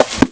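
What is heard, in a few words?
book close; The Elder Scrolls III Morrowind GOTY; XBOX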